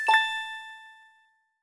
Spot Diff Correct Guess

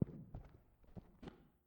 power out step; Five Nights at Treasure Island; windows

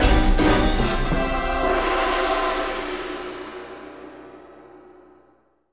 Action END

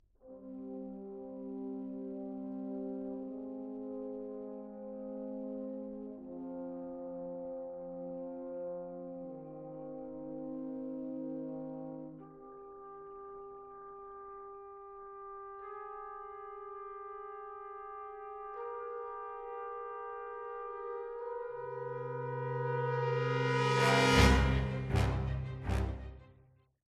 Sting; Dead to Rights: Retribution; Mu Jack Normal 2a Sting

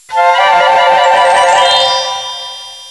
Winning; ABC World Reference Wide World of Animals

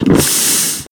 Bellow; Bad Piggies

